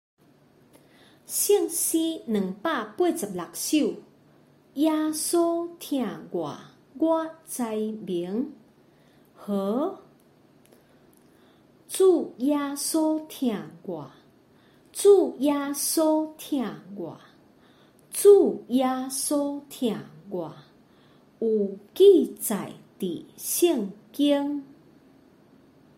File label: Chorus, children; jesus loves me this i know